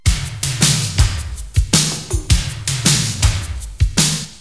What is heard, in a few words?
BEAT, stereo 44k, MUSIC